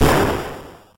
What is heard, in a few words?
Explosion; Voltorb Flip; pokemon reborn e19 2022